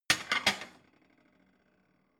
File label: plate shelve slide